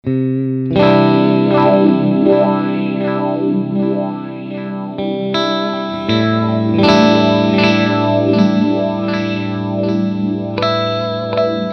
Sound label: Modulation